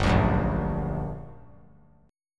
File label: G Minor Major 7th G, 3C, 3F, 3A, 3D; strummed; 7,9,9,8